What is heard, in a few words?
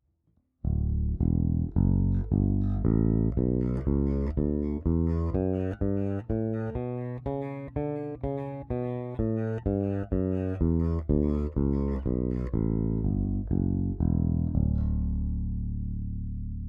Aguilar Super Double vs. Nordstrand Big Blade bridge PU passiv